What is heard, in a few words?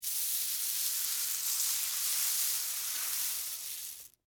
Movement Cereal, Texture, Movement, Cereal, Corn, Flakes; Food (Sound Effects:Household); BlastWave